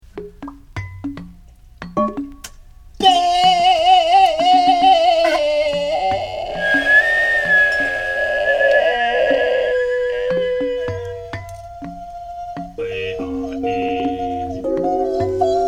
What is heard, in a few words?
glass orchestra